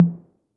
Roland808 HT; Drums; Tr-808

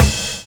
RIDE FILL; Univers Sons

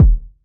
Kick, Kickdrum; Equipped Music; Loungin House

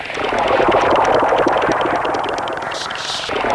WNOISE; Zero-G Ambient 1; WNOISE ATMOS

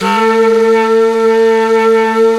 Flute, Singin' Flute

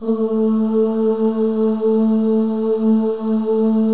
OH, FEM, Symphony of Voices; Spectrasonics

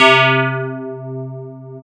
syn, Techno; Techno ID

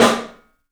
PIC SNR, PICOLO ROOM; 3D Sound

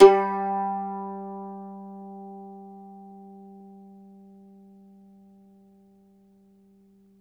MANDOLIN, Plucked Strings

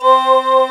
CHORALE

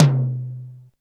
R8 Tom, Roland R-8

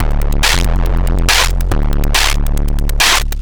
Noise Beat; Drums & Drones

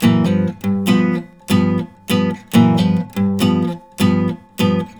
riffs, Blues Guitar; ILIO; Fretworks

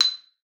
B6, xylophone; Xsample VOL-4

